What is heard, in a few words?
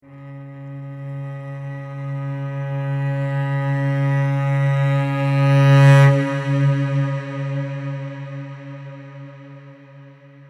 Cello; SparkVerb